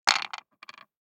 DICE on Wooden Tray, Throw and Roll, Standard, 3 Three Dice; Samples; ArticulatedSounds; DICE Sfx Party (Extended)